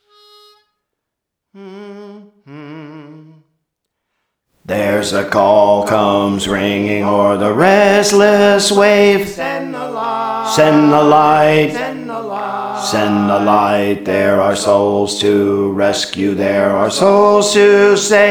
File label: Bass, Praise For The Lord Training